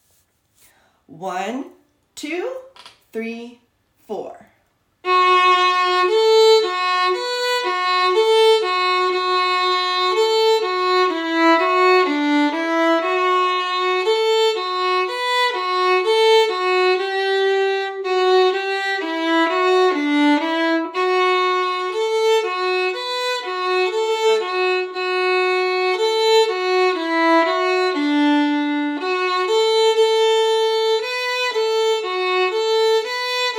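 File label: violin 1; Mountain Road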